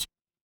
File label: retro game game over